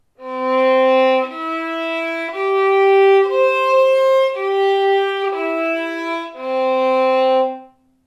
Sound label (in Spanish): do arpegios, escalas; do 1 arpegios; mi primer libro de escalas